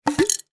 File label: household jar glass candy open